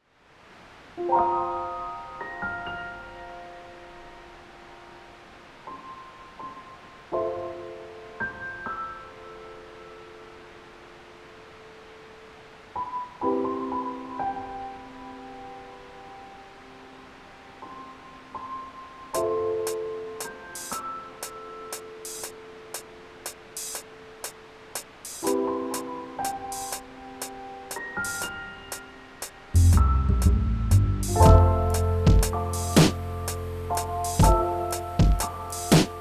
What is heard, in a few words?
Lo-Fi